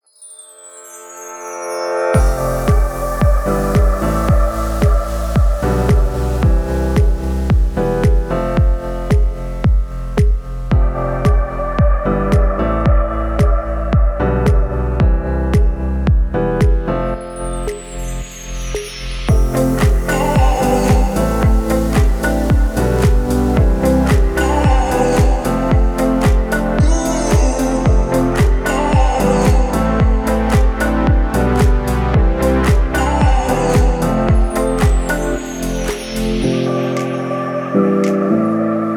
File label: guitar Chamber Orchestra Concerto for Trumpet; Bohdan Warchal; Concerto for Trumpet, Strings